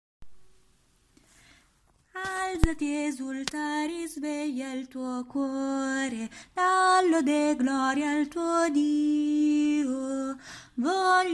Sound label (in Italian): contralti